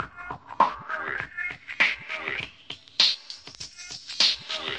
super phasey breakdown, drum loops and breaks, 100 bpm; 091 to 100 bpm